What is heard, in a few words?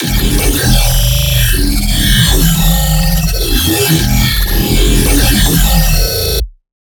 Laser Beast, Dubstep Bass Hits; Ghosthack; Advent Calendar 2017